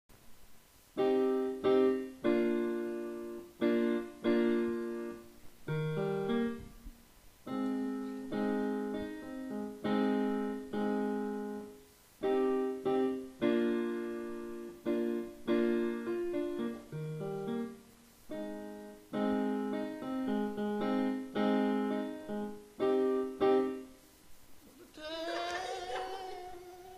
piano buena, music

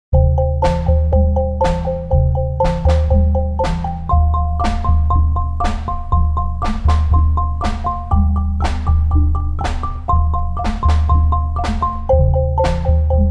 Rock muestra

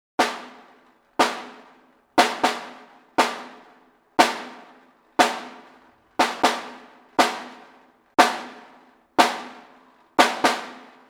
Caja

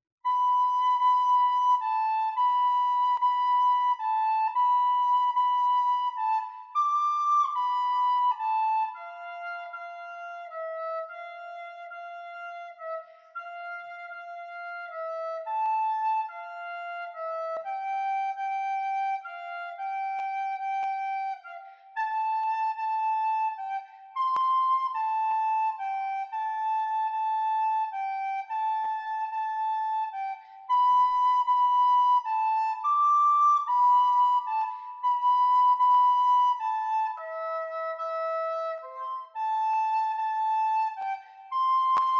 flauta sola

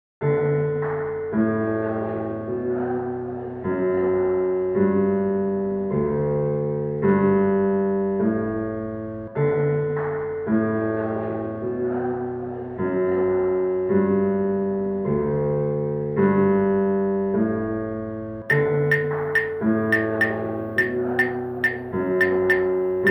Sabor Latino mix

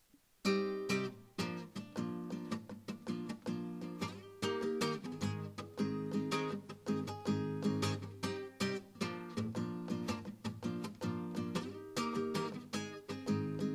Guitarra

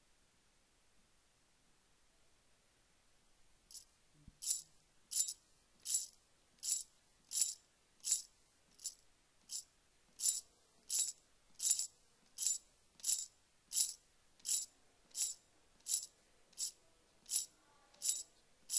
Sonajas

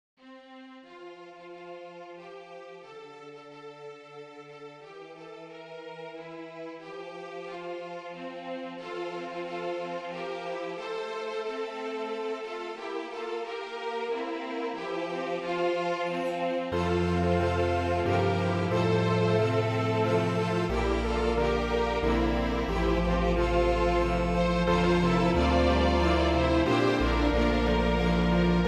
Coro e Jingle

